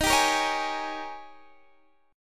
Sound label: Eb 11th Eb, down, Guitar, Open D